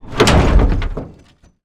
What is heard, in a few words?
steampunk mechanical metal element heavy; Industrial; Bluezone Corporation